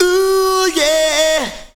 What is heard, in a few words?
OOH, Ragga Samples; AMG - Now CD-ROM (Roland); Soun'Bwoy!